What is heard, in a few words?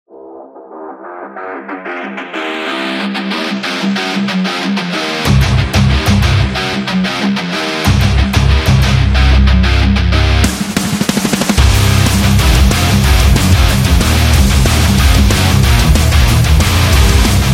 Rock Adventure